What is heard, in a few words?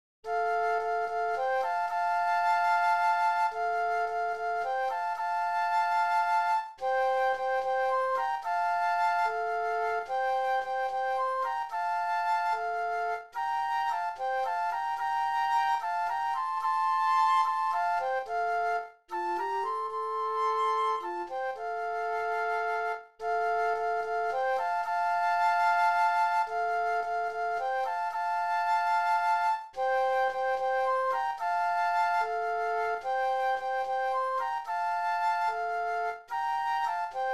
flute duet; classical